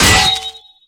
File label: cleaver hit world; tf2classic